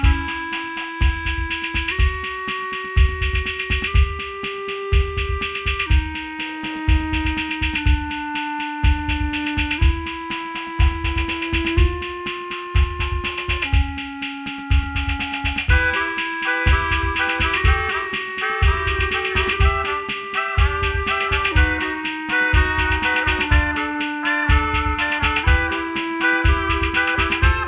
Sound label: Electro Free Pop Klezmer